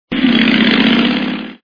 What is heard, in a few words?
Engine Sound; Extra Lives